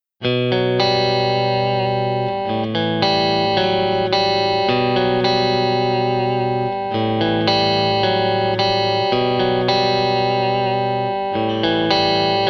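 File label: Country Guitar (Bridge); Digidesign; Pro Tools Essential Loops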